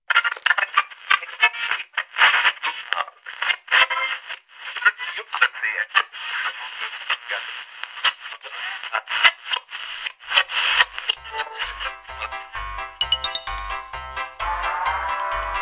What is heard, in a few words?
Radio; Harvester